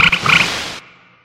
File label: cry